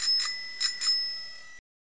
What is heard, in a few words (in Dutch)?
Fiets